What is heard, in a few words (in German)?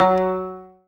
ZIMBAL F#2; Best Service; Ethnic Journey Sound Cube